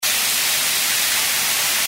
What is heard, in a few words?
shower; Low Blow